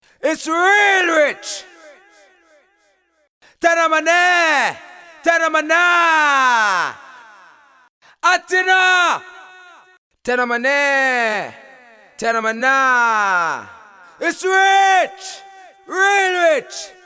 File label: DROPS